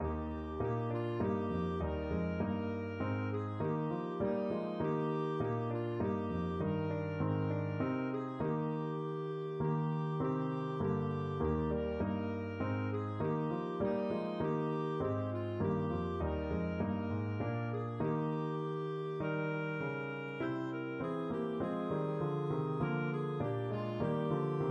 angels, clarinet

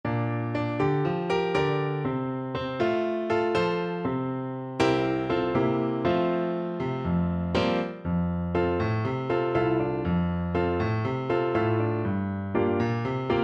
Yellow Bird, Tuba

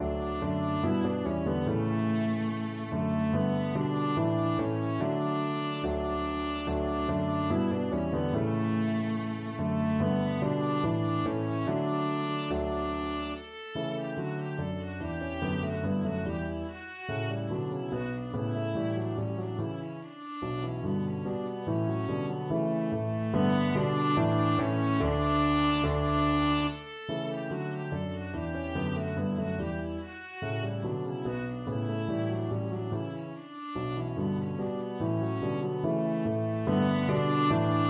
ding dong, viola

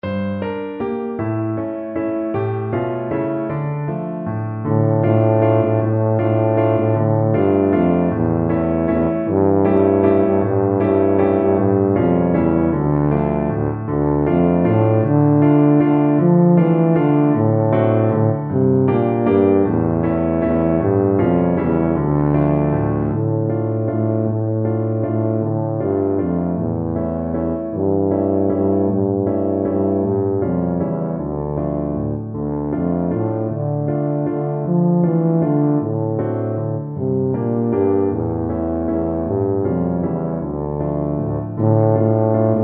tumbalalaika, tuba

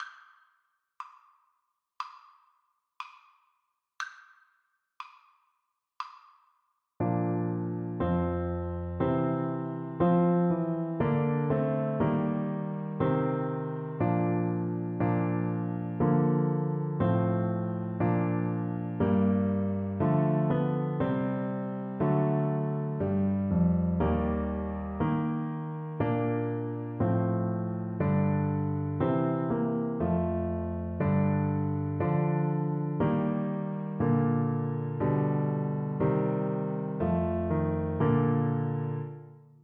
Trumpet

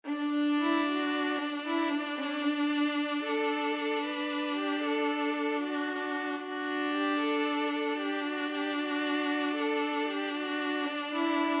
string quartet

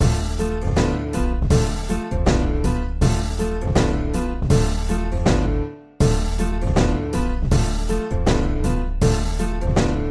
move to the left, Viola

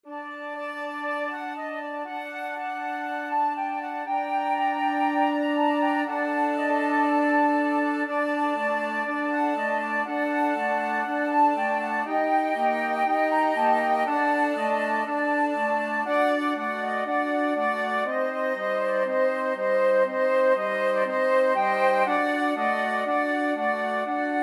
flute choir

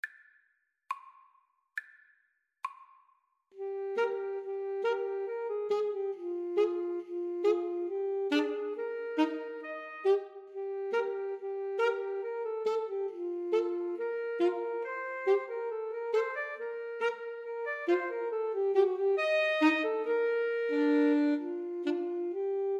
schubert rose, Alto Sax Trio

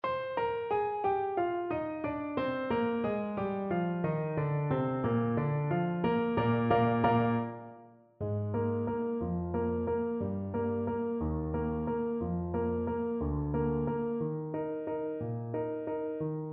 lake wal, clarinet

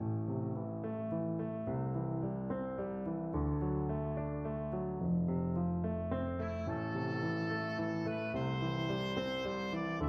House of the rising sun, oboe

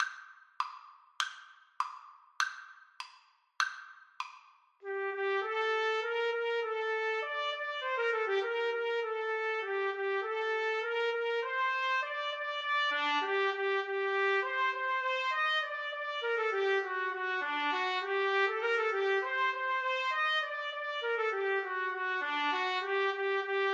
trumpet duet

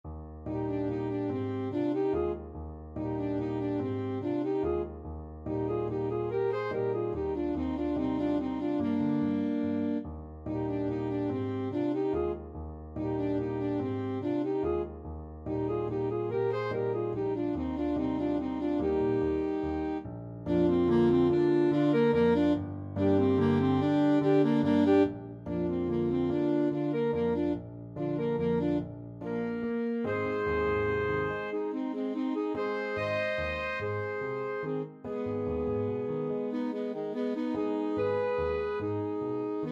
lakme alto saxophone duet; Delibes, Léo; Flower Duet from Lakme; with piano

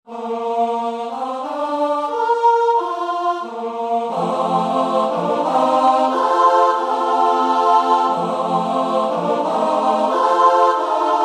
pongauer jodler

